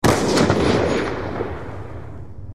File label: BALLISTA SLOW MO